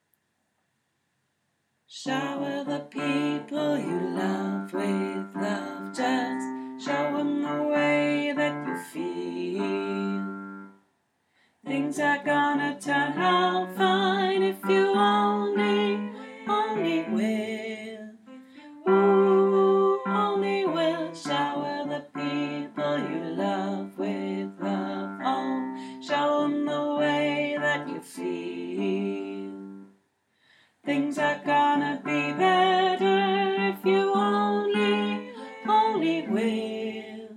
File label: Bass, Singalongs